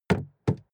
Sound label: suv gas cap close; verkehr transport; autos